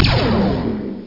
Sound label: PLASMA; TANX Preview